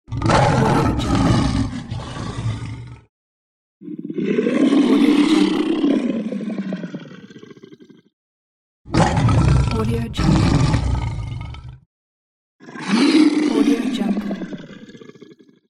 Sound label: lion growling sounds